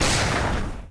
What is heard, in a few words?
punch; Ben 10 - Escape Route; 4arms